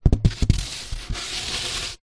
polystyrene expanded block down drag; foley

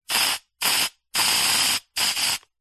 aerosol deodorant several short sprays; zapsplat

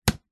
household alarm security wall panel flap close

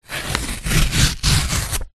paper several sheets thick rip tear; foley

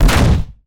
Giant Footstep; Blastwave FX

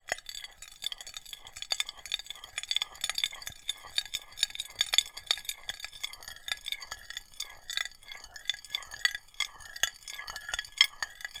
foley glass circular scrapes sharp object

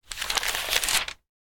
paper magazine page turn; sound effects one

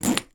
foley suitcase pocket unzip

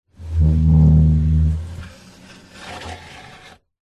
wooden box crate lid slide open

